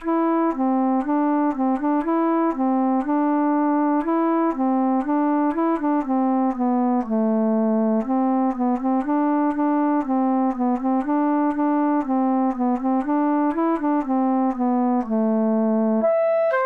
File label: Flute